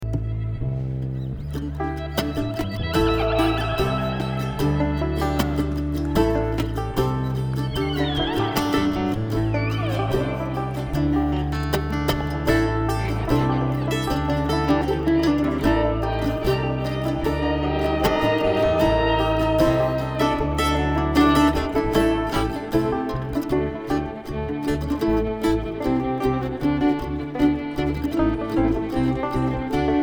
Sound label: Traffic Jam